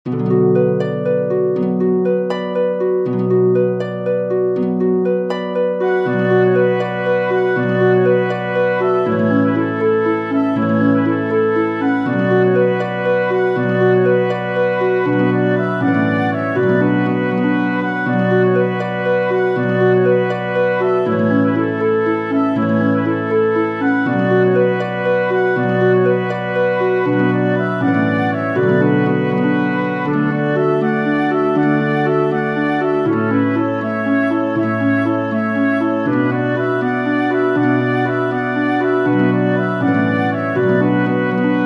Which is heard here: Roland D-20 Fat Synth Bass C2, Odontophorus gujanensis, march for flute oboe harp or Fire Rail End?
march for flute oboe harp